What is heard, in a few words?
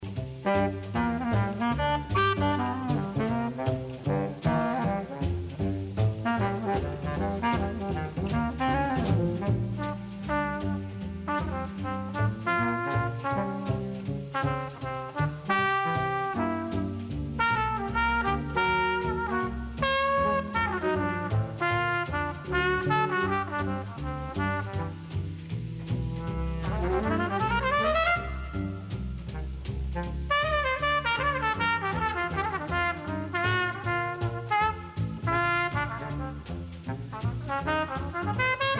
jazz